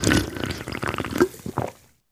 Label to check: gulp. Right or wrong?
Right